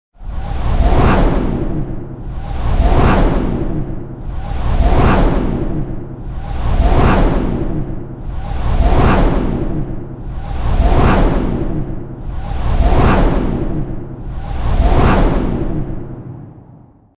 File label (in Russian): originalnyi taimer